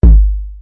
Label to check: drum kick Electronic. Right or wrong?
Right